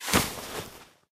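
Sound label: inventory items generic; STALKER; IWP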